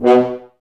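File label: Brass Horns B; Sample CDs; Miroslav Vitous; Woodwind Brass Ensemble; F.Hrns Links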